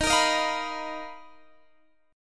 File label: B Major Sharp 11th D sharp